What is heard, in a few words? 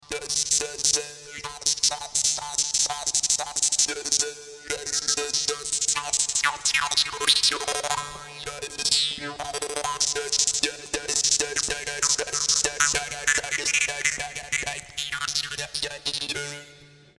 Mini Dan Moi